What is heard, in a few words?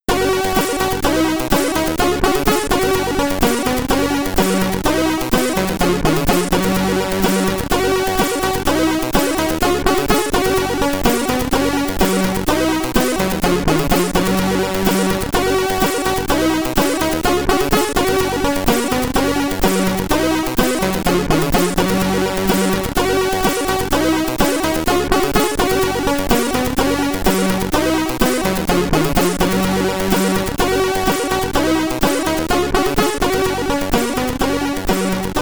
Unused (Bonus) (SID Stereo)